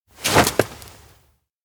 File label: dodge; anemoi パッケージ版